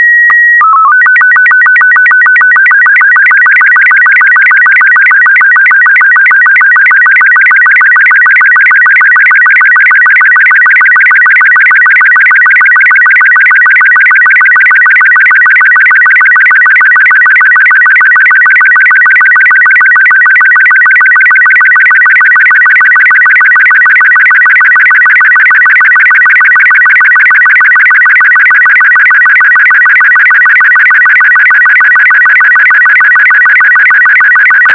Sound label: robot36